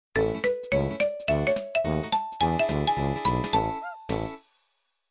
Message Tip Tip

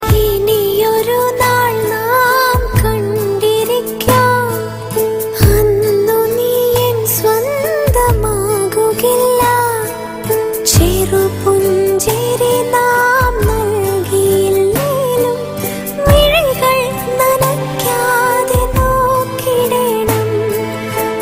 Malayalam

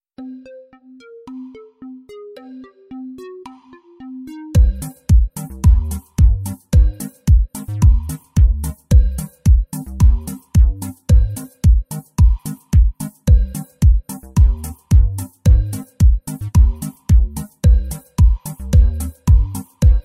Bass Boosted; Kahoot

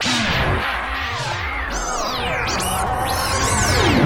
electronic

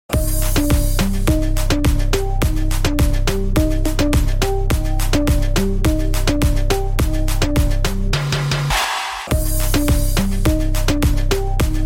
RITMO Beat

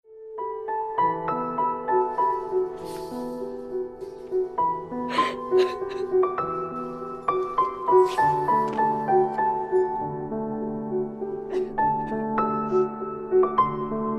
Sad Piano

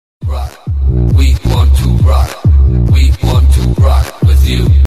Rock

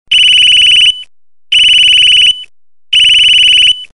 Irritating beeper

